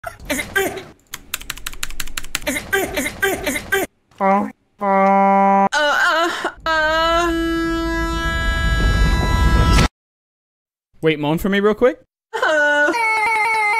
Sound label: Moaning song